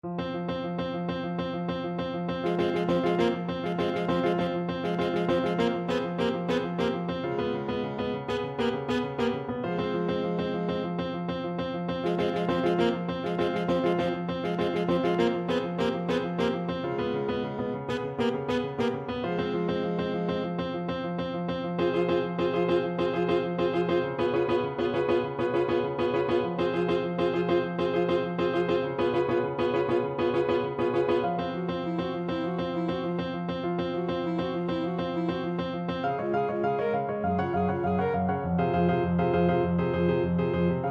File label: saxophone